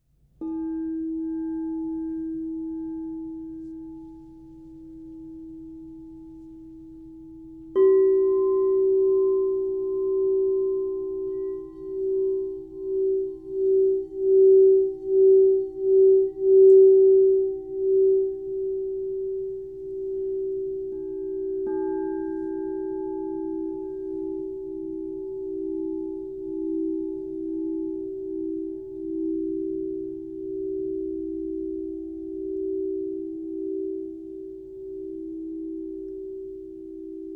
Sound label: Double Chalice 6 Inch